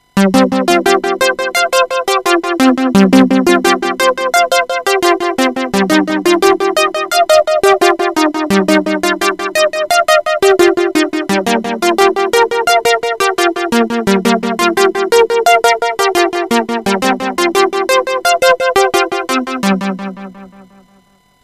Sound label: Delay Arpegio on